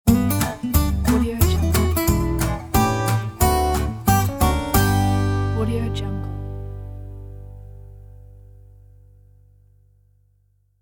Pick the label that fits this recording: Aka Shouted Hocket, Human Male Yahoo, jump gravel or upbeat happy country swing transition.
upbeat happy country swing transition